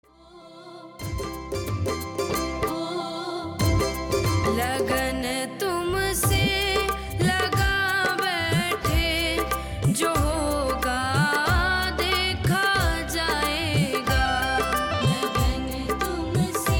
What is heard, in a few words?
Shyam Trending Bhajan